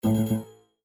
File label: Hardware Fail; Quirky